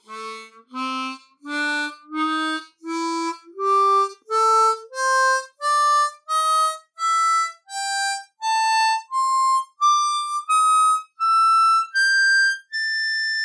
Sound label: PentaHarp 20 Tones